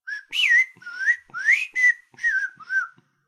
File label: Whistler bird